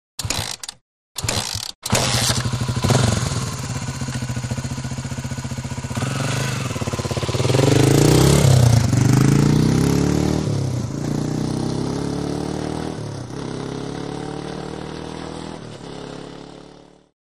Motorcycle; Start / Away; Honda Trials Bike Start Up After A Couple Of Tries And Goes Away To Long Shot.